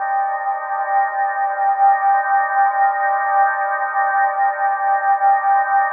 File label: VAPORCHIME, Pads; Distored Reality 1&2